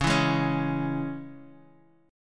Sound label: Db Minor